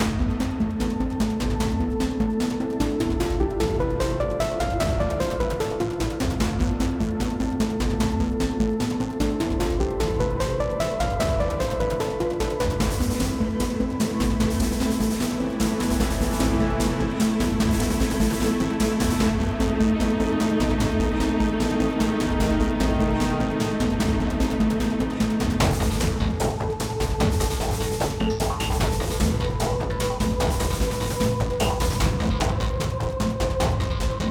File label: Battle; Lassplanes